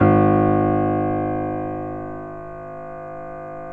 PNO MF G#; Synclavier Sampler Library

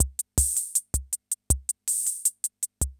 CR78; Best Service; XX Large Most Wanted

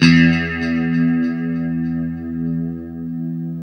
LO E E 2, Guitars; Spectrasonics; Hans Zimmer Guitars VOL-1; JC-SHEEN

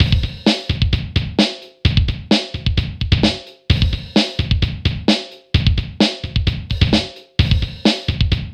ROCK, Funky Xtreams; INIS

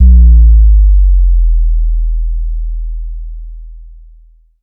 DSP Hit

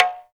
TALK DRM, Heart of Africa; Spectrasonics

